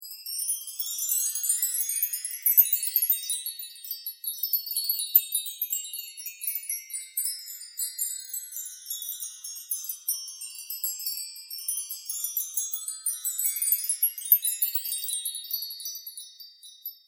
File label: udu chimes